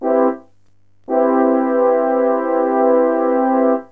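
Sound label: major 7th, synth chime; cubase; CUBASIS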